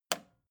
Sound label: record player repeat jump out push